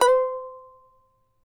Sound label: charango C4, guitars; Spectrasonics; Hans Zimmer Guitars VOL-2